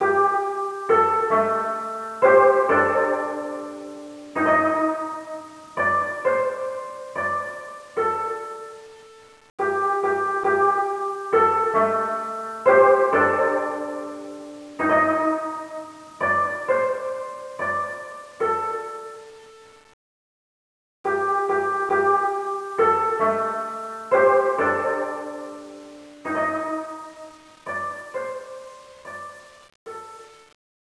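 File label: background music koto; yakuza ryu ga gotoku kiwami 2 pc gamerip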